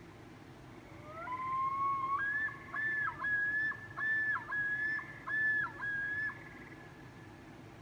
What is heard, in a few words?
loon